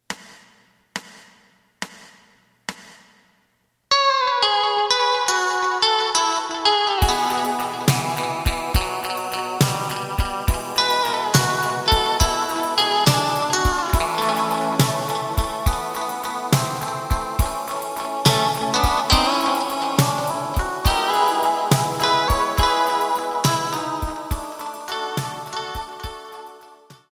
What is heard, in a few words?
bass